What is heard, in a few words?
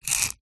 foley brick knife tip score scrape scratch line